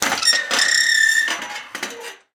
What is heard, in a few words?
Gate Metal Fence Open; Doors